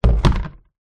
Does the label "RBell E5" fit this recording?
No